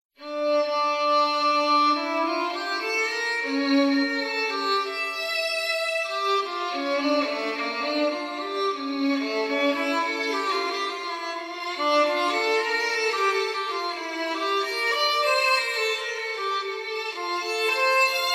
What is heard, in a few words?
violin; Bach